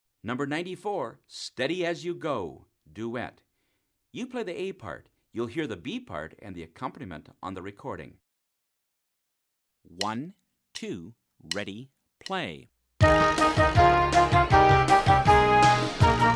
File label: duet